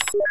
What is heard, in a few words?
remote activate